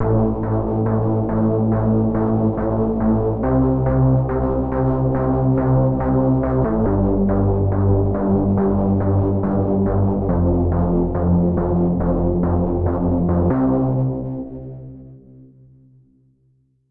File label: HARD BASS 140 A, Hard Trance, Bass